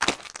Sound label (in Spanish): PASO BARRO; Blade of Darkness